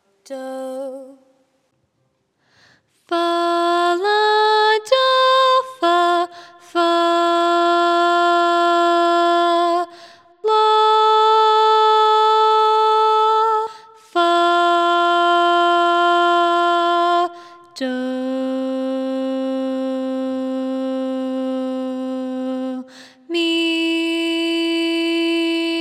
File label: Intonation in C bottom starting note